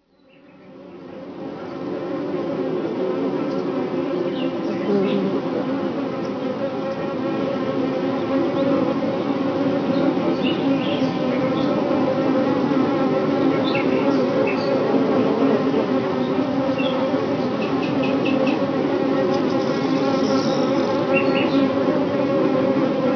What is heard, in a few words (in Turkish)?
ari sesi